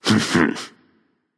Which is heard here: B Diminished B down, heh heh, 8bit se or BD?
heh heh